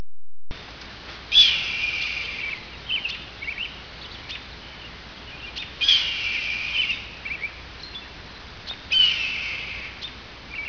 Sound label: Hawk, eastern washington